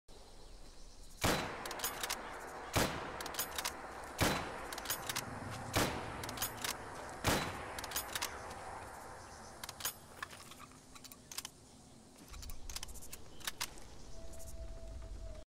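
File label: Lee–Enfield, gun; لی انفیلد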